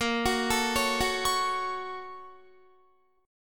A sharp Minor Major 7th Sharp 5th, down, Guitar; 4E,4A,5D,5G,5C,6F; arpeggiated

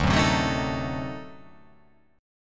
D 7th Flat 9th D down, chord

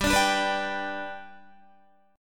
G Major G down Guitar 4F 4A 5C 5G 5C 6E; strummed

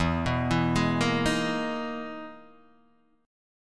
E-Major Sharp 11th E, Guitar Half Step Down

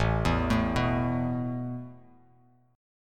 A Suspended 2nd A Bass Standard; Asus2 arpeggiated

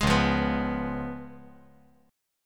C sharp 7th C sharp

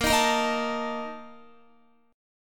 Bb Major 7th Suspended 4th, Guitar

